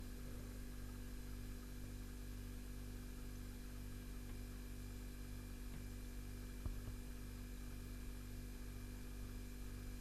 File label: Fridge